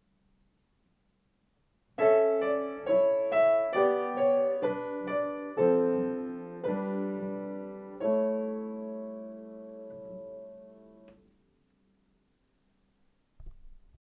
Save Music, Soundtrack for Piano

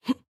Punch; Jackie

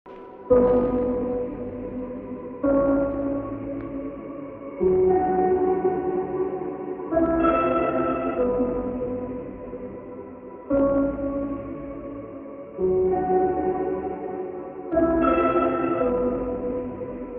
Radio Song; white knuckle

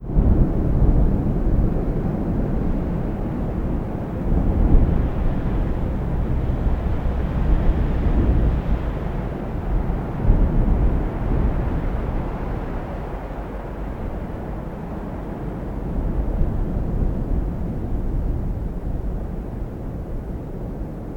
Arche Wind

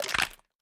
PickUp Bone Big

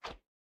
Swing Tiny

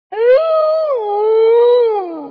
Wolf Die

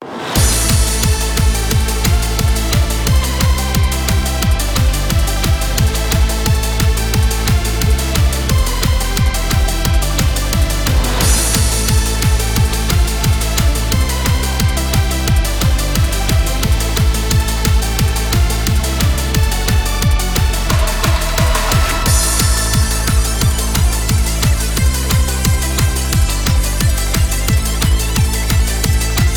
Battle Semifinal; beyblade x evobattle

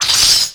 Ice; SE Ing Other Rule#09 (SE Ice00 Hibi)

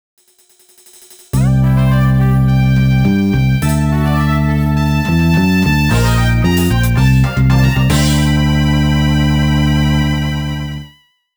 Flyover; Mario Kart DS; Figure-8 Circuit, Waluigi Pinball, Mario Circuit

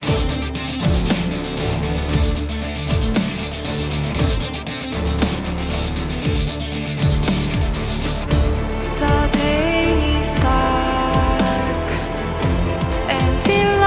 Battle Boss; Eversoul; Android

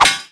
Mouse Trap; Waterski

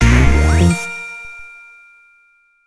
Powerup

